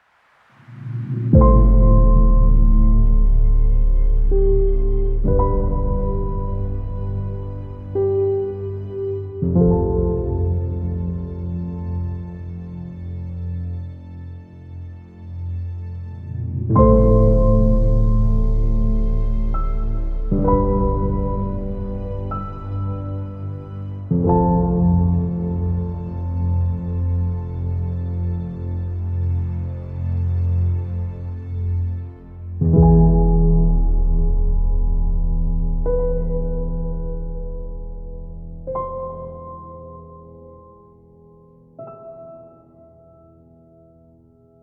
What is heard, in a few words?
Sad Drama Romantic Stinger